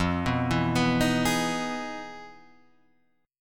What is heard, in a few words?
E Major 7th F; Emaj7/F; arpeggiated; JGuitar